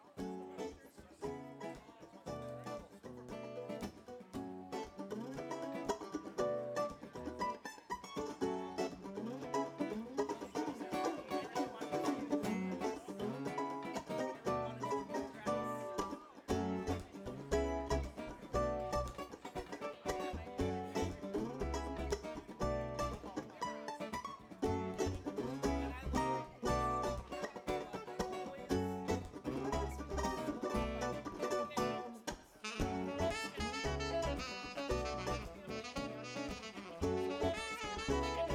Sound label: music; Mile of Music; FUNDERS